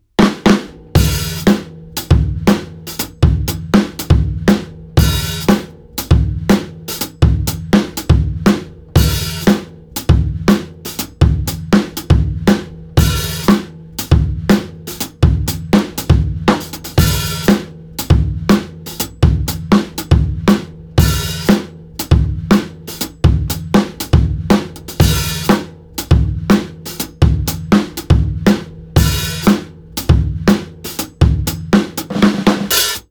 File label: rnb 120 bpm